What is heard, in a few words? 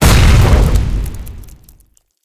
fall damage dealt; video games; source engine; tf